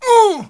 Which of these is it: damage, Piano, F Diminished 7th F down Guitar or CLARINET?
damage